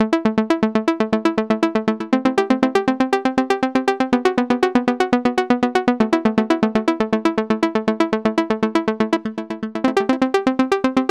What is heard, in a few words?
Arp orig